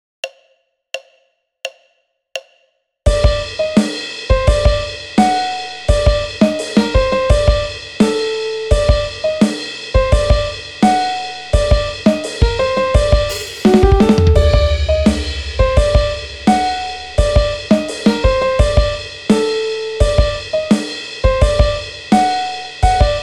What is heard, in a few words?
Riff (piano)